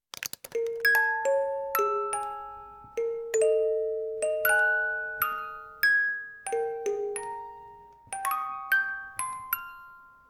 Music Box; Dungeon Punks; ps vita ps4 windows xbox one

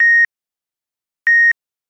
Heart Normal

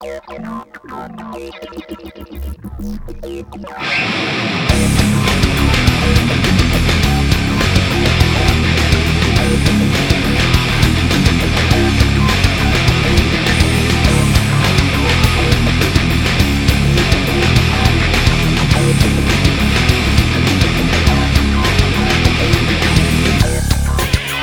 Train Station Fight; Painkiller Black Edition

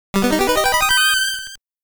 HARP (ハープ); アイドルの妖精伝説; Konami Antiques Family Computer

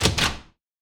Shotgun Pump; Cruelty Squad missing unused soundtracks

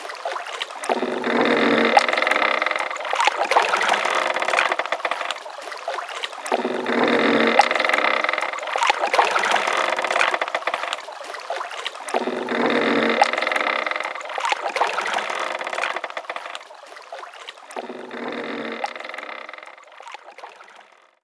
Ambience - Wood Boat; Splatoon

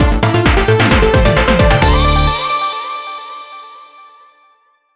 MUSIC EFFECT Space Synth Positive stereo; Glaive Brick Breaker

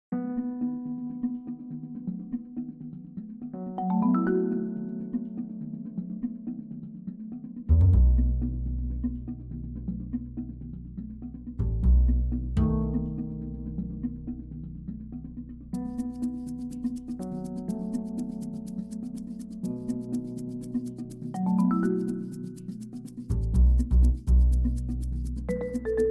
Lifestyle Tv Light Comedy; Curious Lifestyle Tv Light Comedy 12

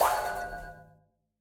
Lab Giant robot Shot; Super Mombo Quest

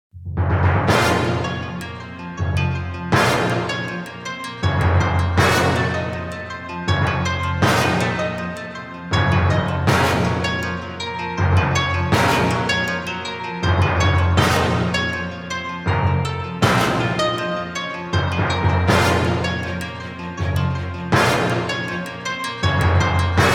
Knight Fighting Long; Inmost

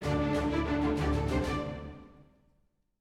Music Fight Pirates Theme Easy Bpm128 End; asterix obelix slap them all 2

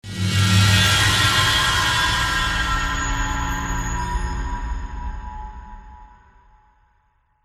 Scare Scrape; Saw The Video Game

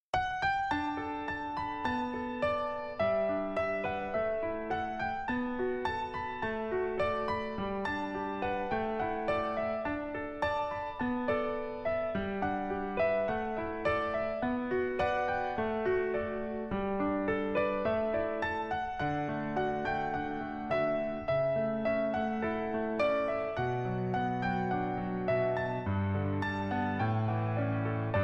Piano Tiles; Pocket World 3D

